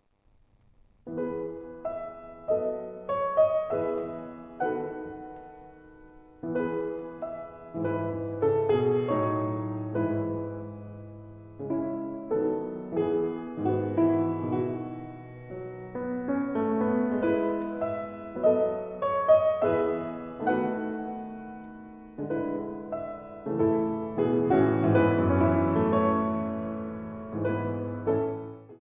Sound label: Piano Trio in A Minor, Tema con Variazioni; Furuya Trio; Tchaikovsky; Op50 II